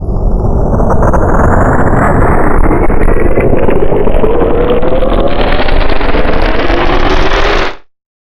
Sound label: risers